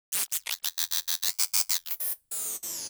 Scratch

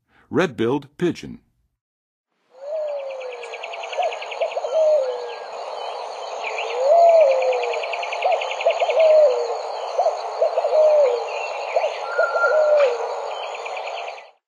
Red-billed Pigeon, Birds of North America; Western USA; Western USA Songbirds

